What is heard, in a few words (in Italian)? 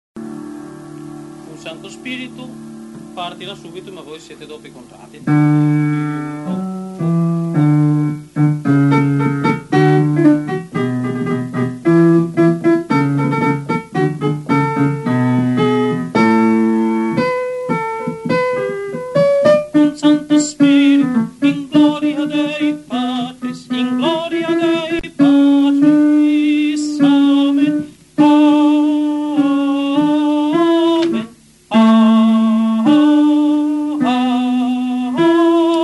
Cum Sancto Spiritu Tenori; Vivaldi Gloria